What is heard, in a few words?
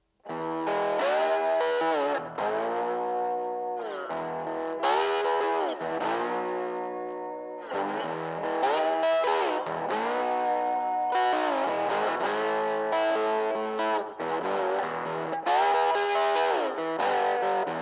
hodad fingers slide gain echo